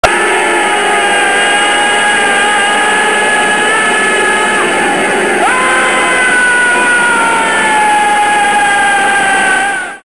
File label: Scream